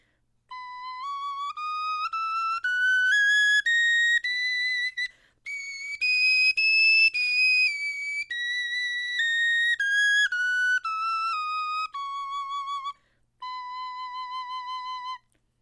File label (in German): tonleiter